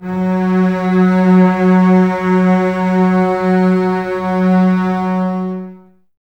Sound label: Basses F#3, String Ensembles; Miroslav Vitous; 90 s Sample CDs